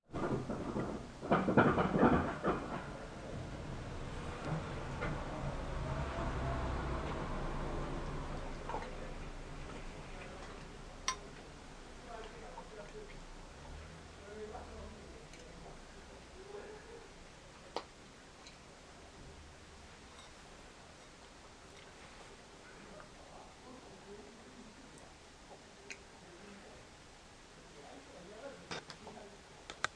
car going

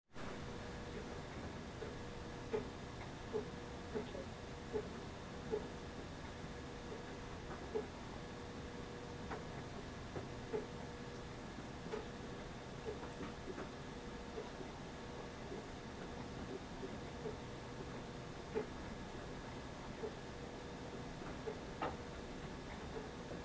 rain drops

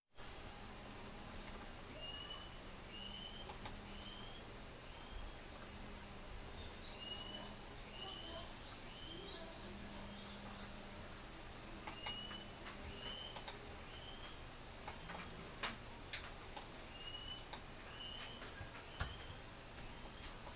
fire

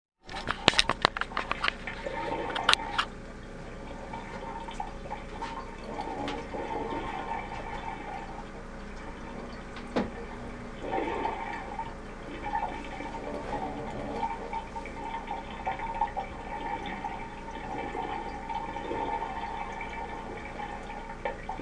drain water

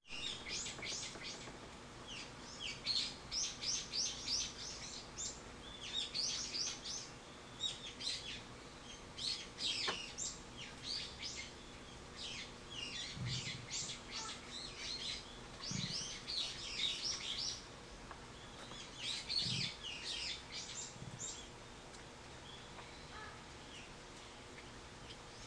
bird

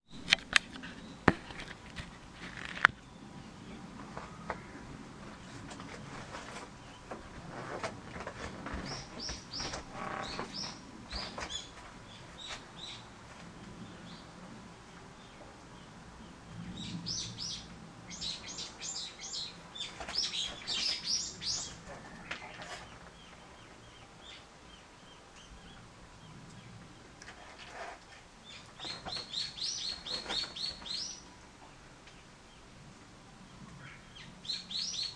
bird

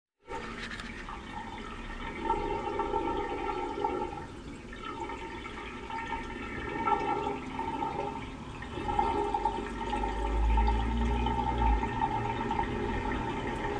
drain water

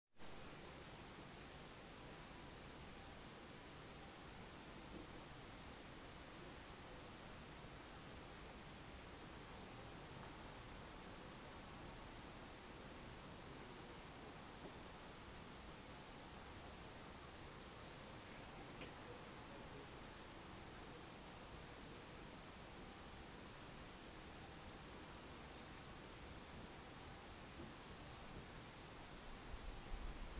noise

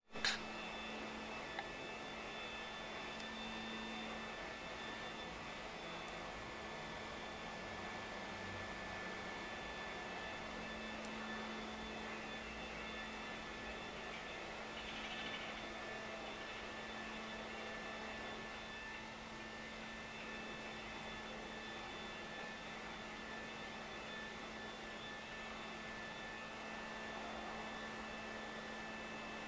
vacuum cleaner

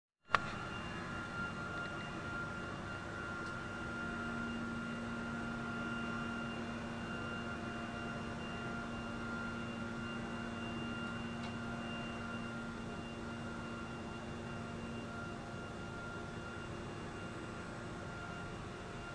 front door; 隣から聞こえてきます

